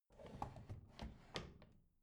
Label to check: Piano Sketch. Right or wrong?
Wrong